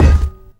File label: physics cube sm; Portal 2